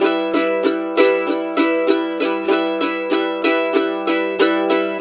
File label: Blues Guitar riff; ILIO; Fretworks; 90's Sample CDs